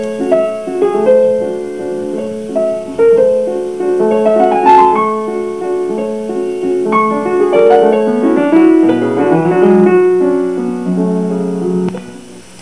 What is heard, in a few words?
romantic waltz